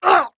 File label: scream